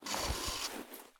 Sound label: prone metal; Escape from Tarkov